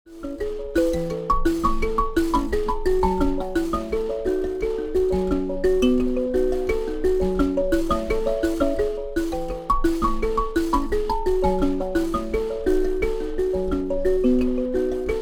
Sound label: solo sample, mbira